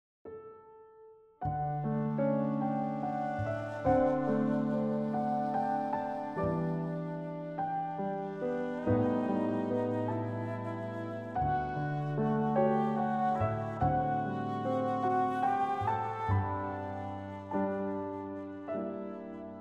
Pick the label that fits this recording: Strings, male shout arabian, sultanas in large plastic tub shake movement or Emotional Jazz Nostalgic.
Emotional Jazz Nostalgic